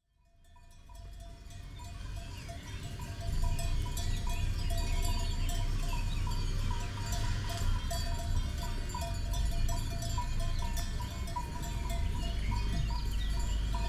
Sheep Bells Strimmer and Cars Passing Erce Ariege France